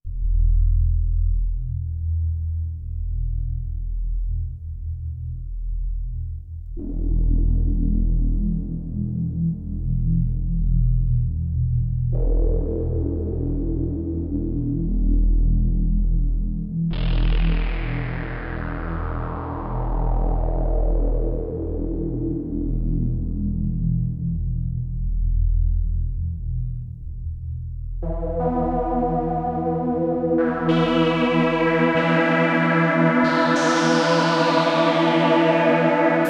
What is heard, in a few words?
kawai k11 sounds 8x osc pad